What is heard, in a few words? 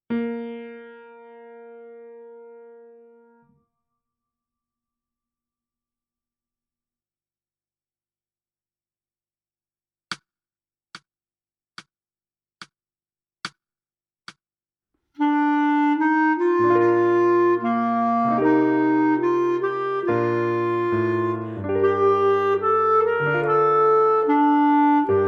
Clar